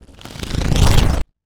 wooshes transform sweetener

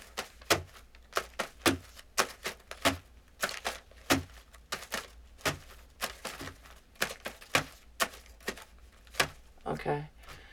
Handling Stacks of Money; Fairlight Sound Lib

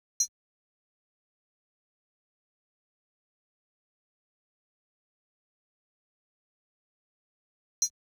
Drum Loop 126 BPM Percussion, Hip Hop; Drum Loops; Loop Stems; Cymatics; Casa Drum Loop

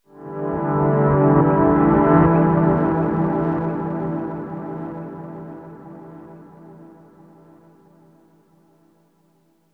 Tonal FX C 90 Bpm, Granular Tonal FX, Lo-Fi Kit; HipHop; Ghosthack; Advent Calendar

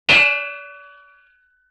Tire Rim Hit, Vintage Cartoon; Drums & Percussion

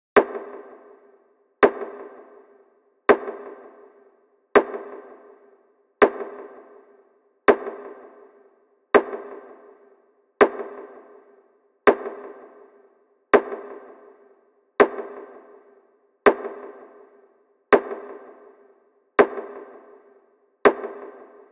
Hallway Knock Drum Loop 82 BPM Rimshot; Drum Loops; Loop Stems; Cymatics; Mystery Pack Vol 4

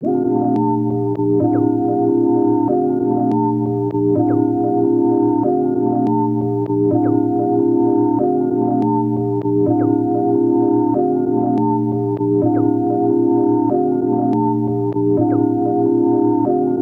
Tape Loop Melody - A#; Tape Loops; Melodies; Cymatics - Eternity Sample Pack

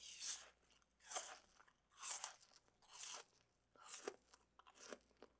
Chewing An Apple; Fairlight Sound Lib

